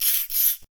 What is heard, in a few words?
hurt, aliens; bloodspider